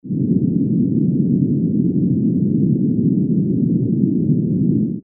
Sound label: bandlimited 120Hz 300Hz